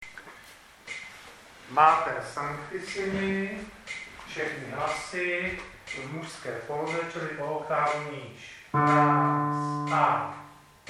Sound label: Mater sanctissimi 2x klavír